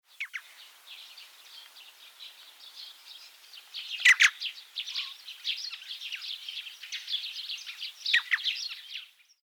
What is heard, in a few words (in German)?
Alpenkraehe Rufe; Alpenkrähe, der Bergvogel; Die Alpenkrähe ist in weiten Teilen Eurasiens anzutreffen und zeichnet sich durch ihr schwarzes Gefieder sowie den langen roten Sc